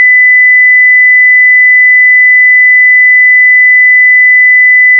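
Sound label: sine frequency 2000Hz amplitude 1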